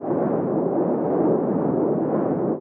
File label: damage hits explosions, Warships